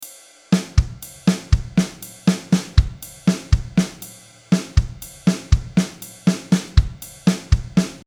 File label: Simple Songo